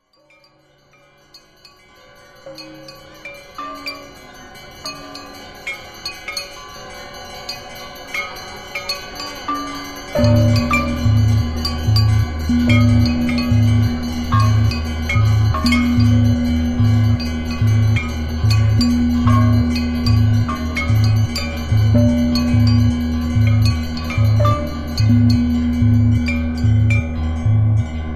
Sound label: drone glock; varanasi